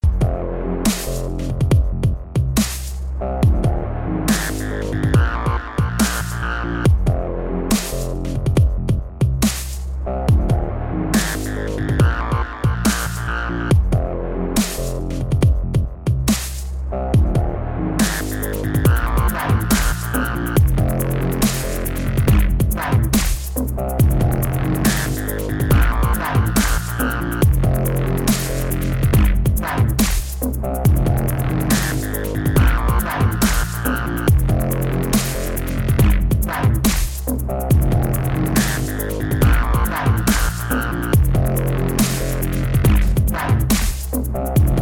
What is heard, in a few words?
muscle noise car